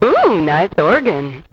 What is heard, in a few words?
Nice Organ